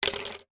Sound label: childs sunglasses put down on surface